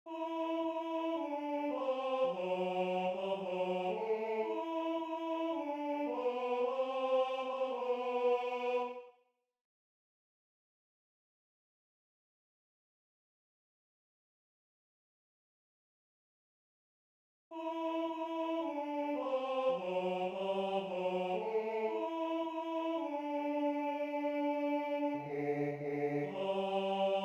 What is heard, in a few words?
Tenor II; I schwing hin